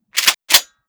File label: 30-30 Lever Action Rifle - Lever, Foley; Weapons